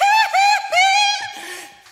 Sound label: hee hee hee, laugh; ooh ahh